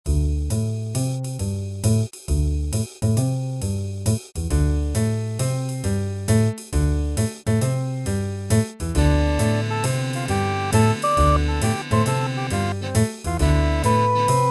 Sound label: score jazz style; shillinger